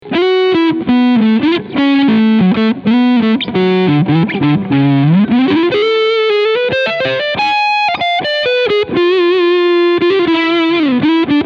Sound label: Overdrive Sound Front Singlecoil; GL Tribute S-500